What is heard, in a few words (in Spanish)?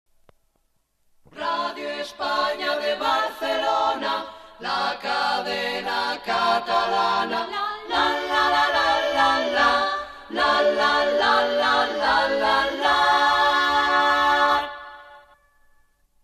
Radio España de Barcelona Cadena Catalana (Cantado)